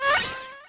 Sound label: battery charge